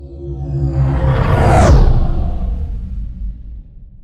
level up; the jewel weaver

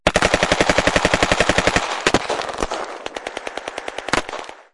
battle gunfire modern

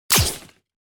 enemy shoot; Zenith-Vector

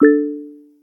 scifi plink

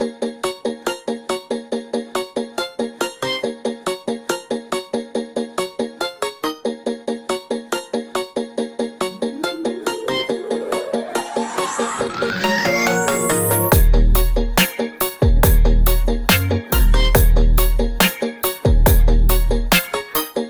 vortex; music

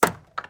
chop; Fablewood

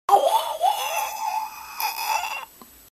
Enemy Sprayer Death; overrun

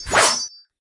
counter; tyrants edge

